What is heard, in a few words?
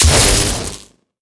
red lightning burst